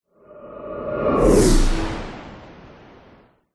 whoosh element pass by machine vehicle

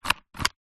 foley slider plastic slide; zapsplat

